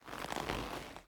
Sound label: Leather Foley; The Town